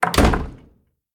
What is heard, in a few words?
wooden door close; Devour